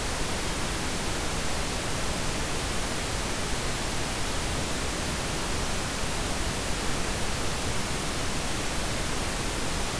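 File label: pink, Test Sounds; 20Hz to 20kHz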